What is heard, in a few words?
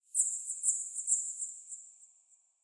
Under City Bat Chatter Distant; Ambience; Dungeons